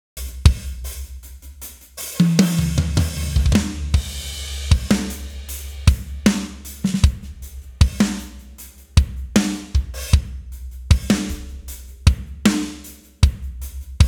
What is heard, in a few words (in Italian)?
batteria processata